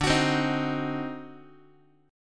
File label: D 7th Flat 9th, Guitar; 4A,4E,5A,5D,5G#,6C#; strummed; JGuitar